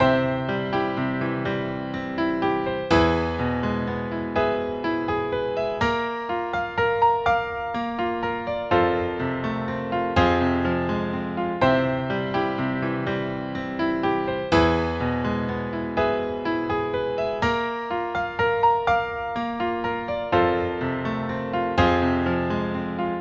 piano

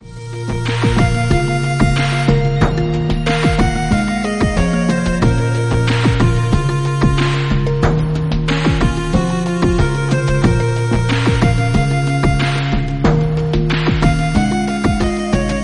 jungle

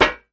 metal

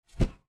whoosh; deadmaze

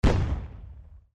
explosion

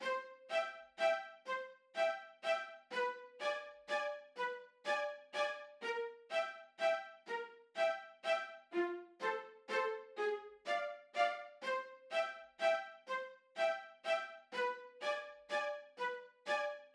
violas

